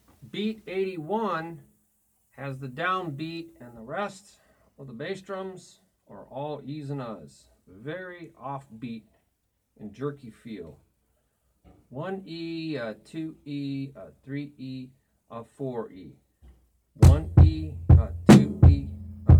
Beat 1 e a 2 e a 3 e a 4 e a Toom Ba Ba Cha Ba Ba Ti Ba Ba Cha Ba Ba